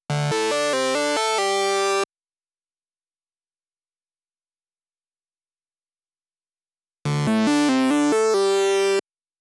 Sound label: 138BPM Synth Breaker DRY, Dminor; Melody & Synth; Vengeance Samples; VTS1 Nightfly Kit